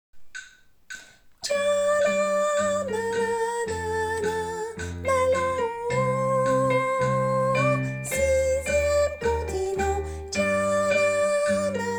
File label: tenor